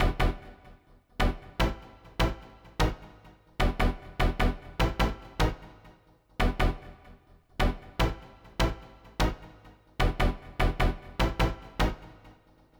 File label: Synth; Hip Hop Philosophy; big fish audio; Apple Loops